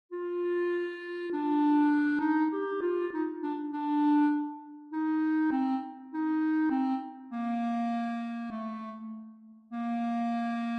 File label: Clarinet Concerto